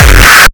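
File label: Kick Industrial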